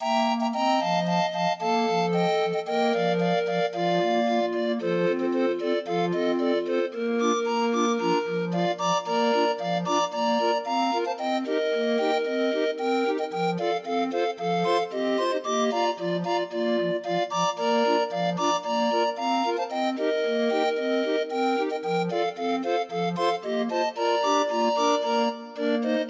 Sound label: Mister Cuckoo Sing Your Song, Grind Organ